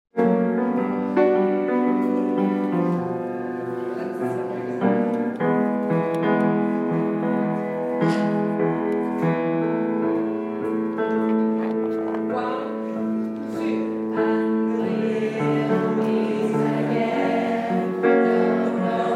school choir; lansdowne

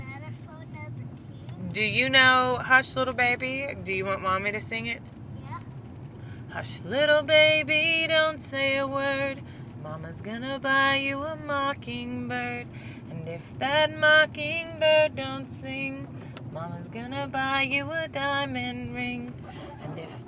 singing

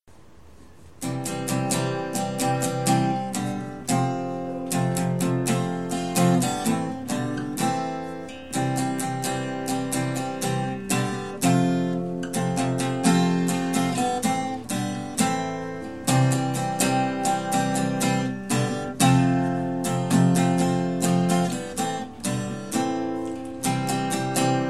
guitar cover

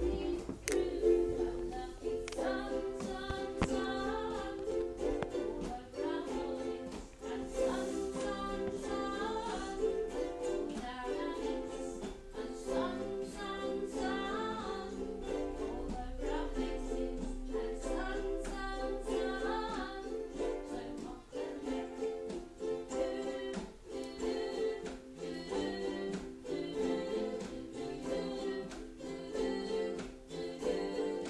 ukulele

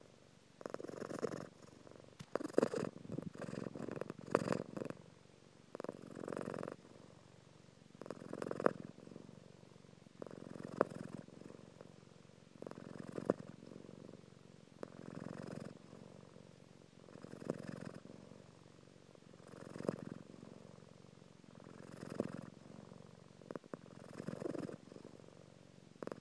loudest purr ever

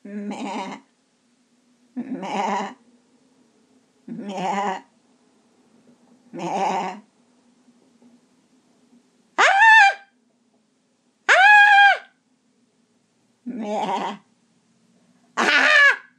screaming goats

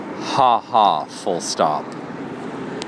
ha ha

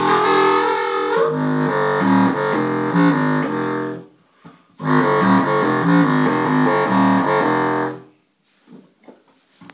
contrabass clarinet through 1 x 15 bass amp

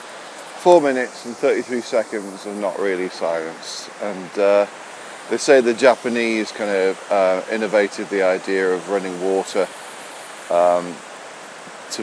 post heavy rain waterfall cwmaman

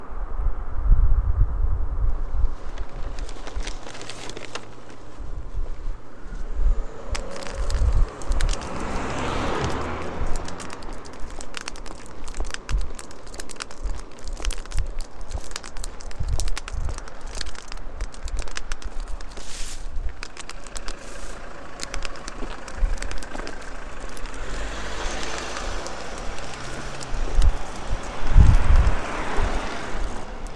spring birdsong on walk home

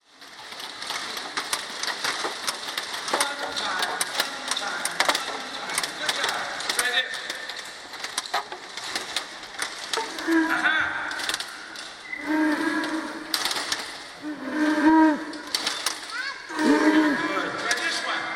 the sound of drumpets

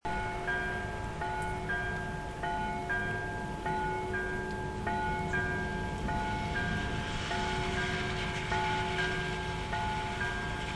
rain thunder church bells airplanes and cars

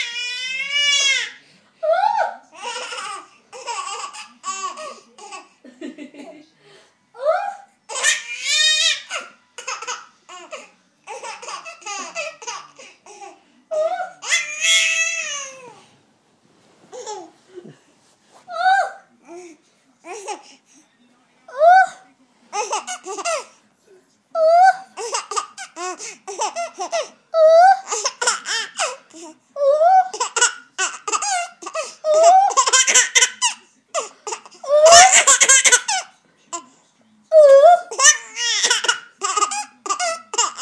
laughing at mummy

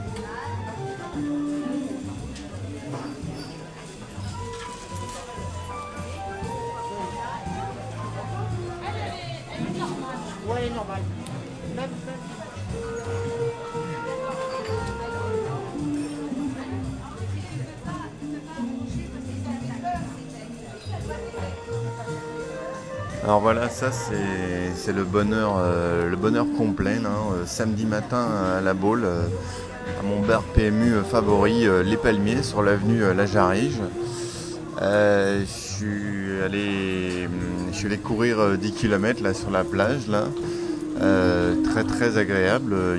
jazz; a la baule

